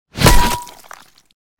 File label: bloody metal bat